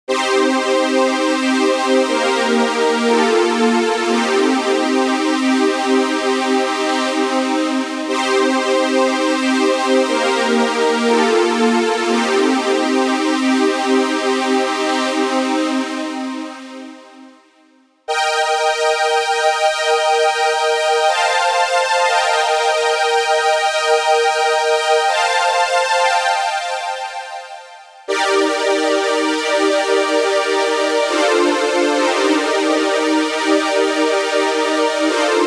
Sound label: M12 Strings 120bpm E on C